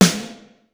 chrome sn; sr16 sd

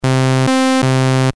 saw; のこぎり波; nord3

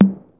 hitom, tr808

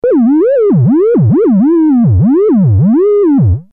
lfo s rnd; Soft Random（S/H（サンプル＆ホールド）波形にポルタメントをかけたような波形）; nord

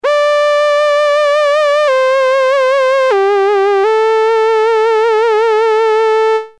bend vib, nord

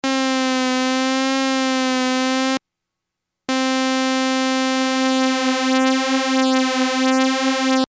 lfo osc; 片方のオシレータにだけLFOをわずかに掛け、もう片方はそのままでミックスすると、ただのデチューンのウネリより複雑な揺れが得られる。; nord3

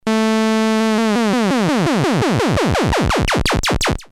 mod saw

